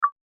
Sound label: beep